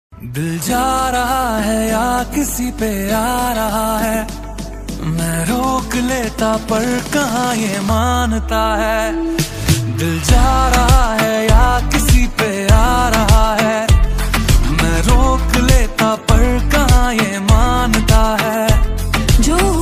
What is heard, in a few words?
hindi ringtone